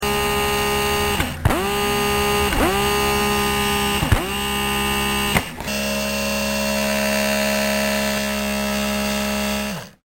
Dentist Drill

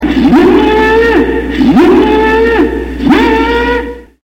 steam collision

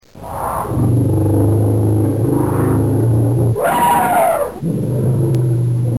elephant

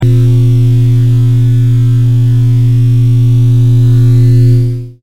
BELL

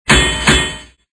2 Swords

